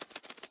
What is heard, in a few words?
fly, parrot; Minecraft